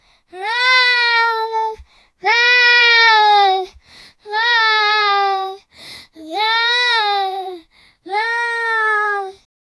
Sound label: 9 year old girl; human vocalizations